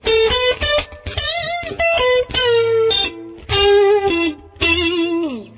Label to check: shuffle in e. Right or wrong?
Right